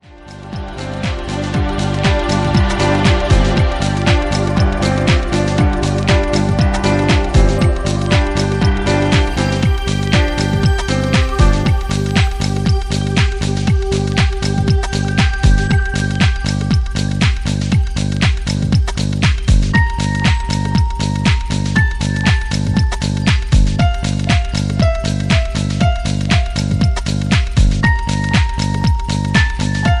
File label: electro blues groovy lounge ringtone